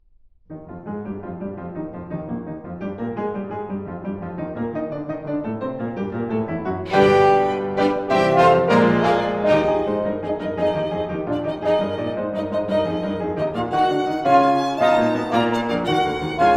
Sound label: Trio for Piano, Violin and Horn in E flat major - Scherzo; Brahms Trio & Quintet